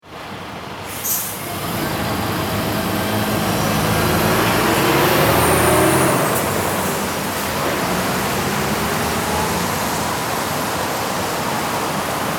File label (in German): Verkehr an der Ampel